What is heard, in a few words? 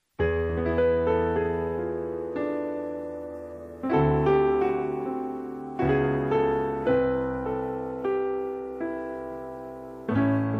live direct recording into the Olympus DM670 of Korg Kronos 88 german grand piano